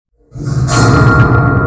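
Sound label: dramatic shining sound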